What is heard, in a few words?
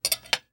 Household Fork Set Down